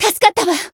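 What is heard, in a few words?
healed, Japanese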